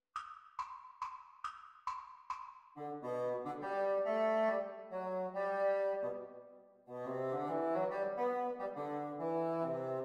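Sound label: Bassoon Duets; Exam Speed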